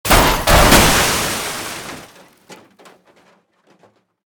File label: crash, highway; Cosmic Rage